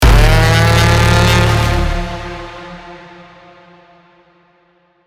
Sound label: mega horn gloomiest cinematic trailer; sound effects